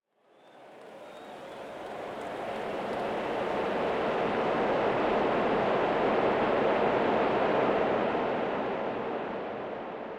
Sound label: Crowd Ambience; Pitch Black Audio Pong